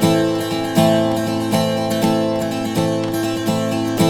Blues Guitar Samples; ILIO; Fretworks

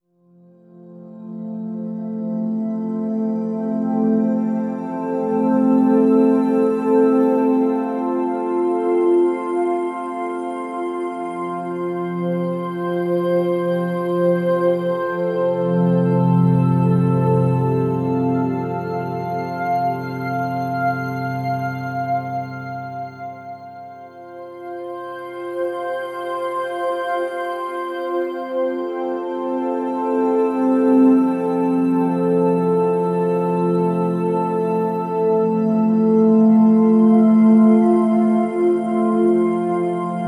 Drift Bed, Spektral Minimalism; Sonic Foundry (Sony Creative Software); James Johnson; Complete Beds

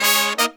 Horns, 110BPM; 90 s Sample CDs; Zero-G; Phantom Horns

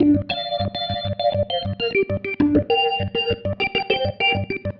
Pattern, Organ

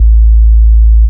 sub A, techno trance bass

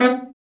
BRA BARI, Bariton Sax

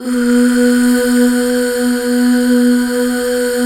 UUHH, Back Uuh Fem

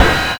ride, trash kit; USB Soundscan vol.11; Drums Dance & Groove; AKAI

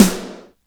GATE SN, Snare

